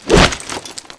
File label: heavy swing